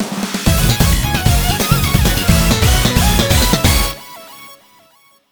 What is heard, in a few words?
Missioncomplete